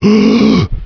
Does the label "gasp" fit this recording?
Yes